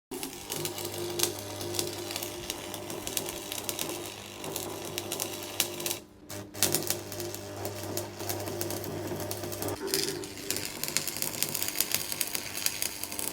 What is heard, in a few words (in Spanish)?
Ruido de SOLDADORA eléctrica